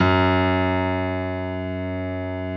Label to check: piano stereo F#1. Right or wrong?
Right